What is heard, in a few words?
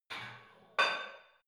barbell light plate put on hit reverby soft; sport freizeit; fitnessstudio